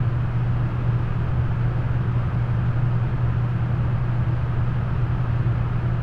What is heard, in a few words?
vent; Golden Crayon Sounds